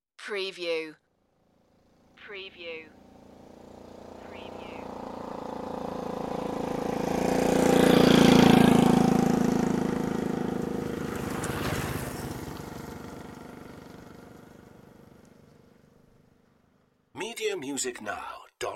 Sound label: rattly motorbikes passing